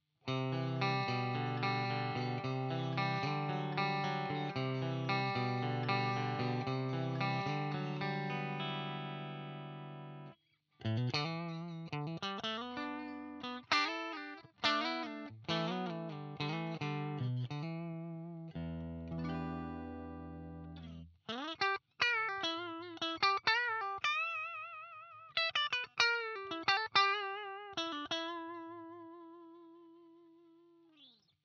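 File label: Fender Am Pro bridge CLEAN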